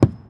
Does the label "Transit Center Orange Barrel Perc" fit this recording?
Yes